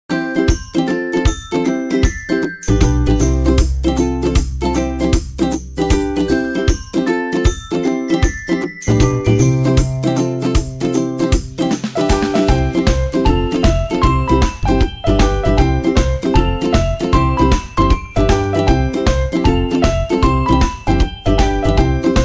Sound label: Melody of Happiness, Background Music